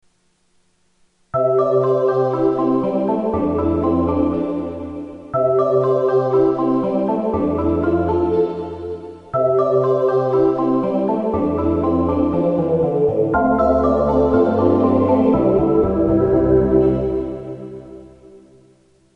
opening echo

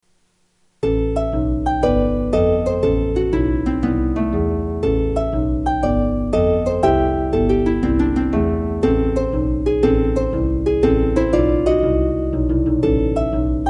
opening jazz hp

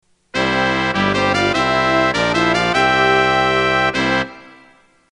opening brass

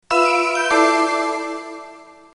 alarm

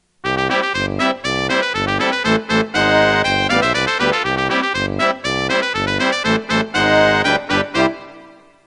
opening brass

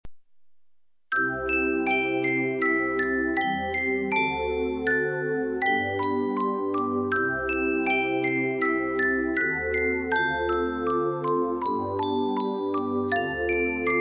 handbell

